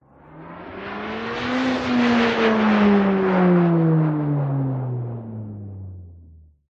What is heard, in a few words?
game airplane passby animation particle swish swoosh accent logo sci fi futuristic; alien; ufo